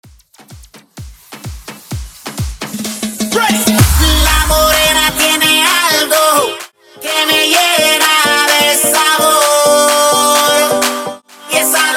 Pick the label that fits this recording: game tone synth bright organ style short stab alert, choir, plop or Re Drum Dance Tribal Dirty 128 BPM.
Re Drum Dance Tribal Dirty 128 BPM